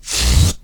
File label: Balloon